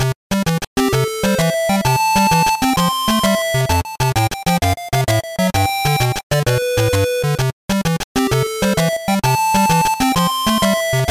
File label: Skipping march; puchicon; dsi ds